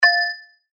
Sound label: Synth Shot F#; synth shots tech house; Flight; revealed